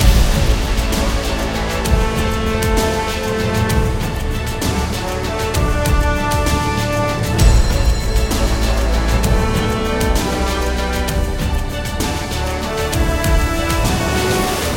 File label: big Win Loop; oceans treasure